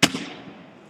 Fieldhouse Grocery Sack

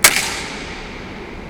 Two Clipboards

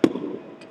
Tube

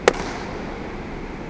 Fieldhouse Book Close

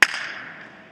Toy

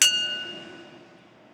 Fieldhouse Glass

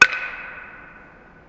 Wood Blocks

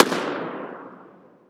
Fieldhouse Balloon

